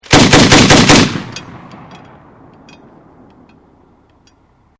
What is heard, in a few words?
MK19 burst fire, MK19 Grenade Launcher Burst; Grenades; Explosions; VRSonic